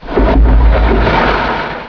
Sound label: big stop, plats